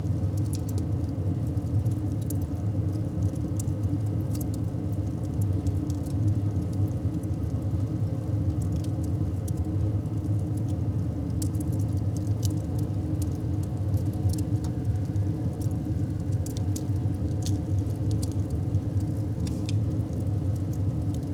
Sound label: ambience room forge base layer loop